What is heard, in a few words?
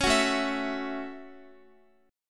A 6th, Guitar; A6 strummed; x,x,11,11,9,11